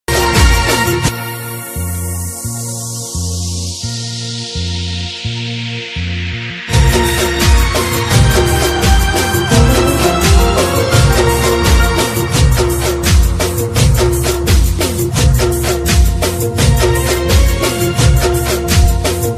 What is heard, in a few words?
gujarati ringtones